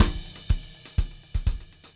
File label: SAMBA, drum